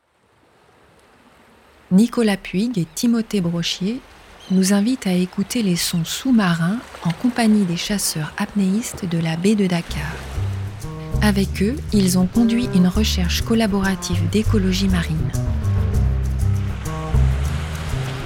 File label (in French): pad